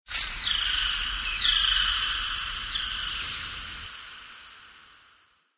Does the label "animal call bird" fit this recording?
Yes